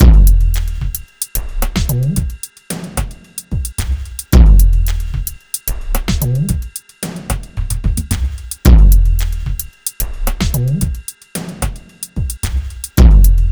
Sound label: Beat, Drums & Percussion; Electrocution; Beats